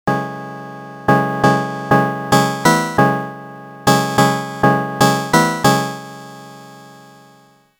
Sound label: Modal Craft Synth 2.0